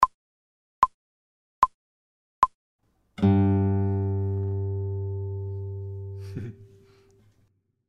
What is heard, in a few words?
guitar, without metronome; fast